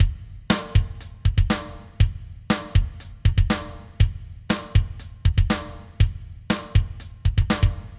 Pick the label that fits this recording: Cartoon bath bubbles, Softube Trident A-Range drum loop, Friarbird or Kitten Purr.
Softube Trident A-Range drum loop